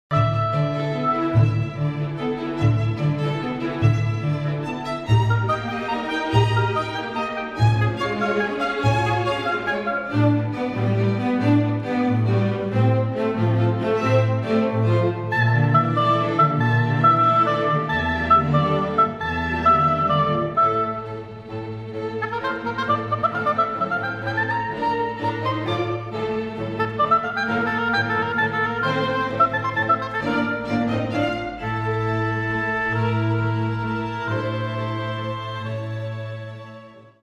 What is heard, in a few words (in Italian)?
Oboe e Orchestra d'Archi Allegro Finale; Concerto per Oboe e Orchestra d'Archi 4. Allegro Finale